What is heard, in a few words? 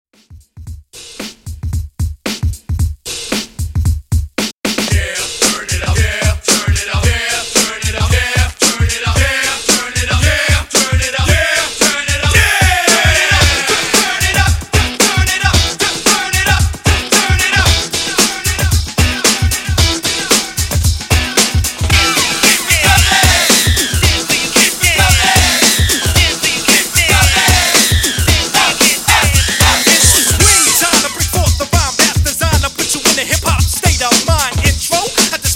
old school redrum 113 bpm